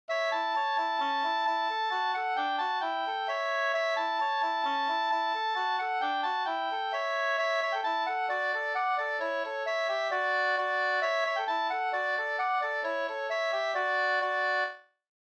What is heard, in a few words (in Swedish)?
folkdans Ddur 2st; Lintunen